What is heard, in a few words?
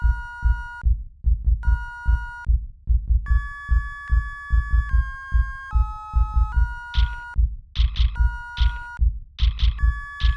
Sine Wave